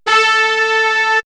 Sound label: brass ensemble, horn section; X-Static Goldmine; backtothe70's